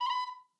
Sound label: easteregg flute; goldentavern